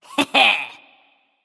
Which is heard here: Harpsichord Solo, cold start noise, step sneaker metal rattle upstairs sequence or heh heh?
heh heh